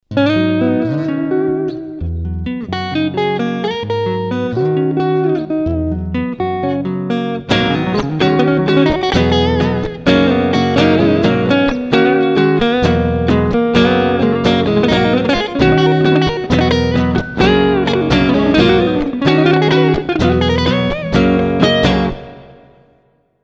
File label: Pick Up On Acogi